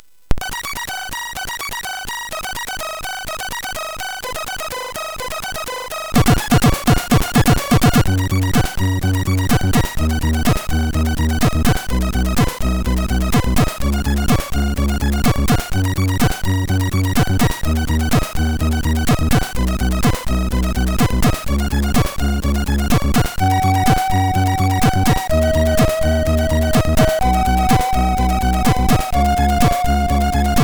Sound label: Blob, MOS6581R4; hvsc